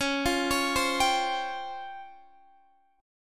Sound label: Db Major Sharp 11th, Guitar DADGAD